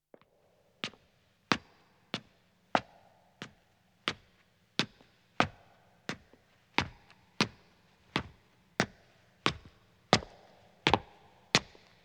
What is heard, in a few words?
Stone Stairs (Echo) Ascending; Footsteps; BBC Sound Effects Library